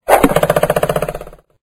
arrow

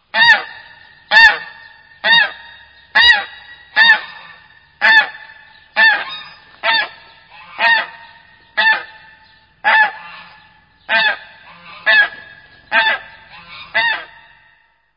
Geese Honking

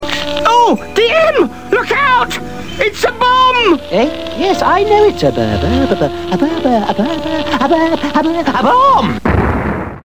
a bomb

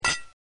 CRYSTAL

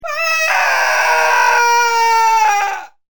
SCREAM

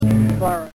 truck

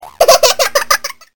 GIGGLE

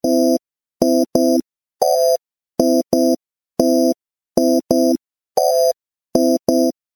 phone No Rev

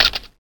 click

